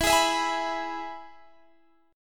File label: E Major guitar chord, Standard; strummed; JGuitar